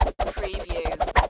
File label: Multiple Wind Whips